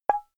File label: glass short; signale